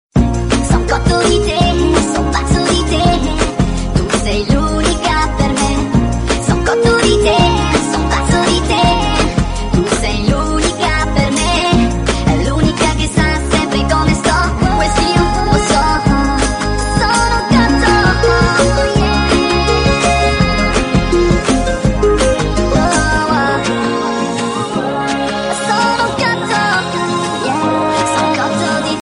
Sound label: italian tune ringtone